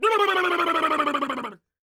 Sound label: LAUGH, World Voices, ANDES; Spectrasonics Vocal Planet